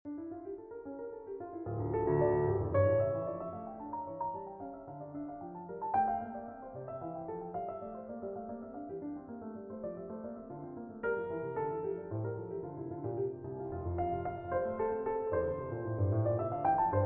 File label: Invention in D Minor